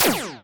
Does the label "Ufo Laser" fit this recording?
Yes